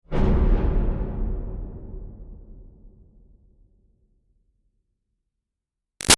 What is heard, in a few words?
Boom Bodyfall Dramatic Reverb; Low Impacts; BigRoomSound